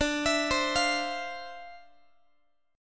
D-Minor 9th, D, Guitar, 4A, 4E, 5A, 5D, 5G sharp, 6C sharp; arpeggiated; x,22,19,22,21,x